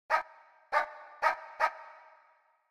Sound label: dog